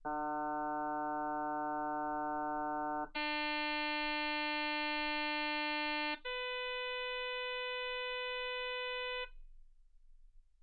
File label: harm sinus 48kHz